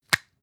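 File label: floss close compartment hard, Bathroom; haus-garten; badezimmer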